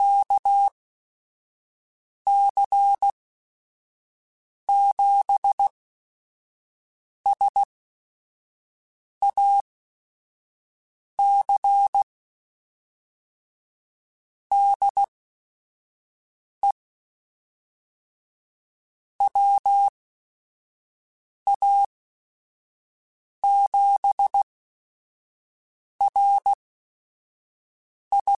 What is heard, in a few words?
Morse Code, QSOs